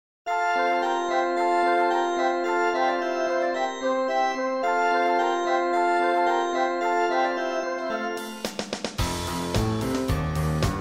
karaoke in B; augustus

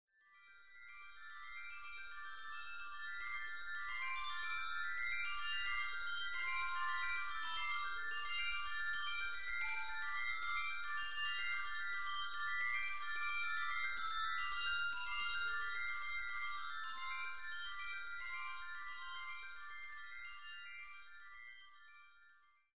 glocken

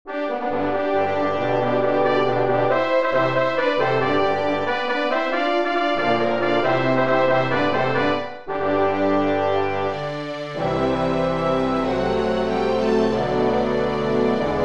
fanfare; 開演のファンファーレ; フルオケ・バージョン; laputa

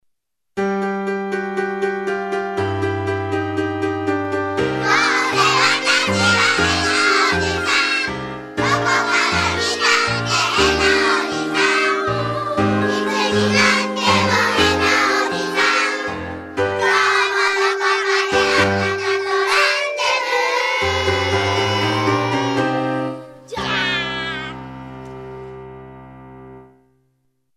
chorus

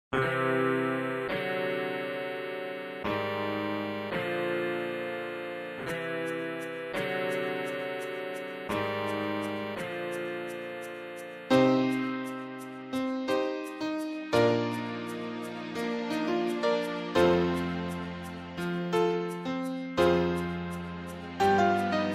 reprise nodrums

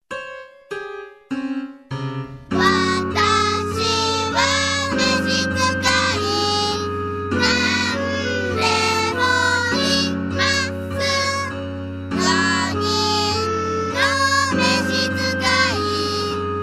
solo; mesitukai

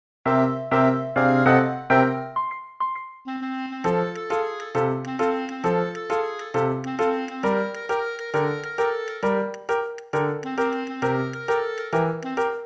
magic show

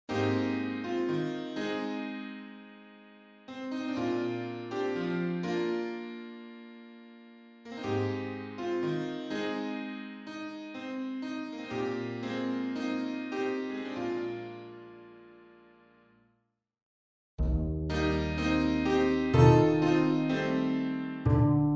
karaoke in B

